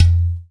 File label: UDU; Spectrasonics; Heart of Africa